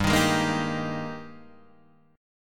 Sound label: Ab Major 7th Suspended 2nd G, Guitar